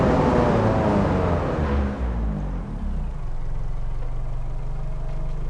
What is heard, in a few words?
throttle off, vehicles; gmod; tdmcars; focus svt